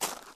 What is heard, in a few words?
ground, steps; VoxelEngine